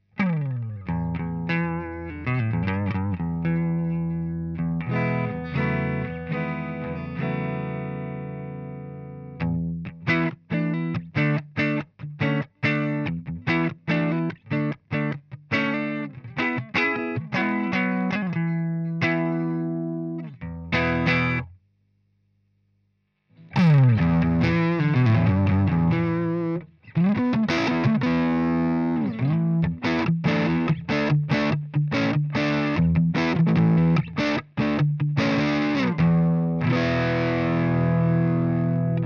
KATANA CH1 Rhythm

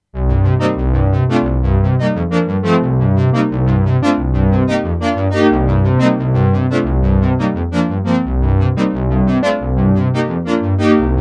Cello

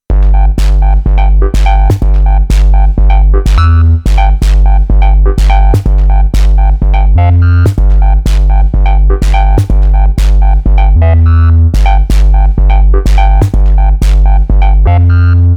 Korg minilogue xd

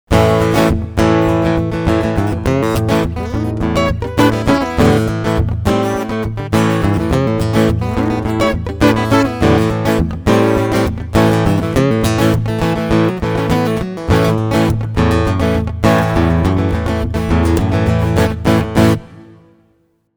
Rhythm EQ Flat

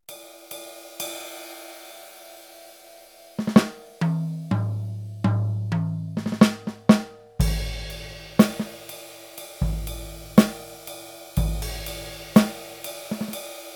Kit 3; EFNOTE 5; First Note

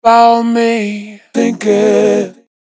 Vocals

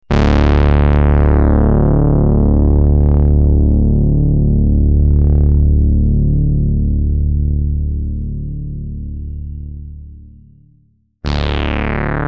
resonance

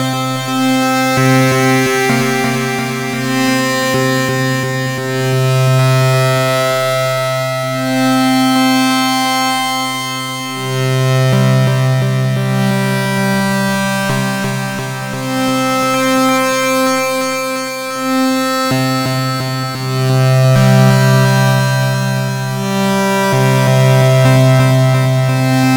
Trident Track Shift Slow; Rossum Electro-Music

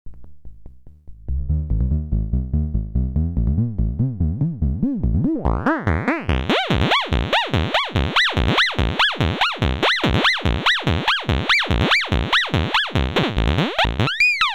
BASSLINE CV FM

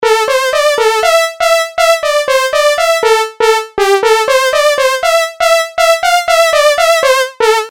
Eleven Brass

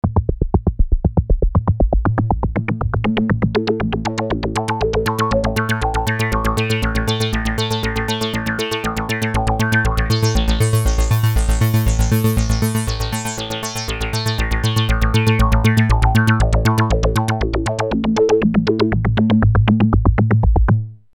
ARP; P600 gligli

